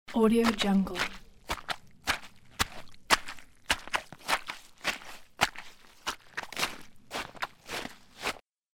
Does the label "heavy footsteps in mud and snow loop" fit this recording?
Yes